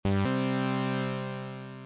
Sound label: Win; ringtones